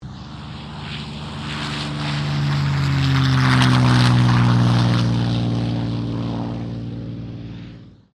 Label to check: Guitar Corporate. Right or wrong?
Wrong